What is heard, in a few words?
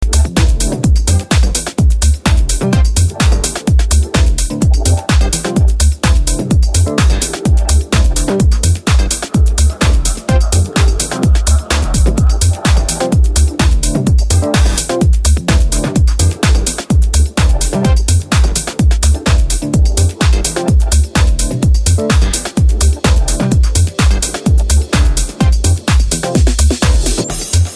Glitch Machines original mix